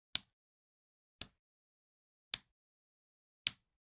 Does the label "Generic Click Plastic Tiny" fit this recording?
Yes